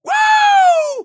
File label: woo